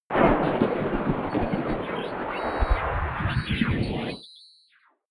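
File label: lo-fi randomness, science fiction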